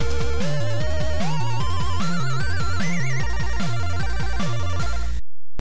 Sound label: Section Completed; Silver Surfer; nes